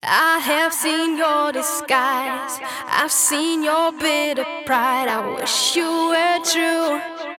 delay ohne ducking; Vocal; plug hy; Free Vocal Plugins

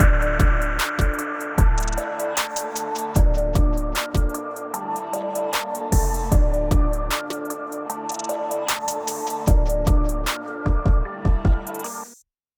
beat vorher; EQ Tipps für Beats; 5 EQ Tipps für Beats

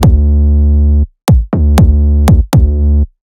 kick vor 808; Der ultimative 808 Producing Guide

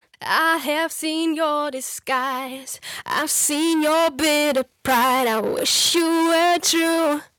saturator tube effekt; Vocal; Free Vocal Plugins

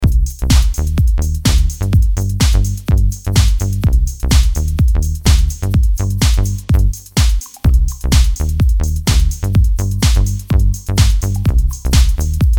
bass beats bauen synthesizer

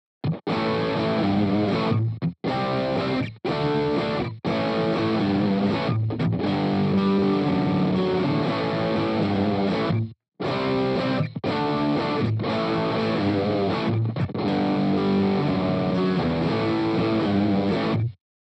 Gitarrensound fetter machen Endergebnis links

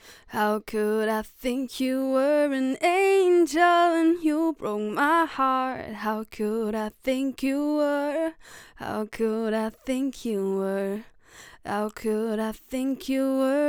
rode nt1000 gesang

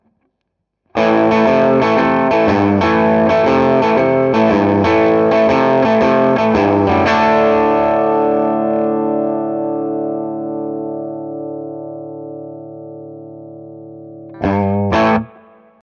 kush audio hammer dsp e-gitarre limiter only

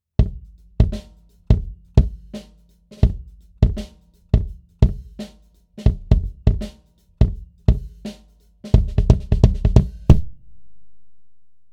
TG D71c Bassdrum innen; beyerdynamic

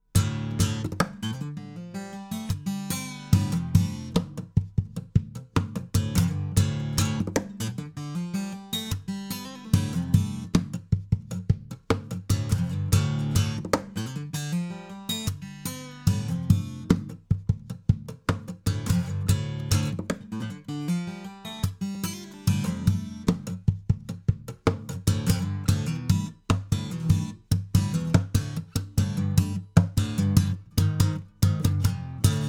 perkussiv lowcut, fame pro series sd-100

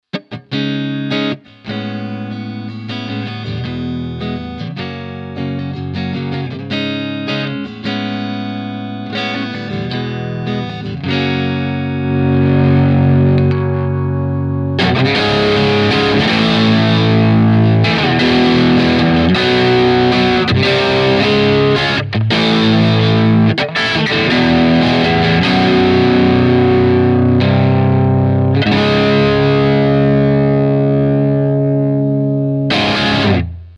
framus artist phil xg p90 p90 clean hals bis gain steg

